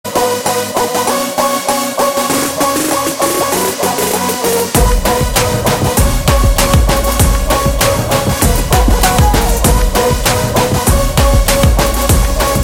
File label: Mass BGM